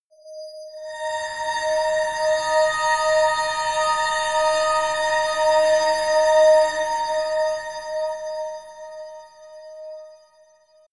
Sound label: scrapes ringing blitter harmonic; musikelemente; drones